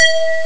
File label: lead; Amiga Soundtracker sample